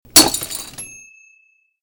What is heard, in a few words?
Glass Breaking Quieter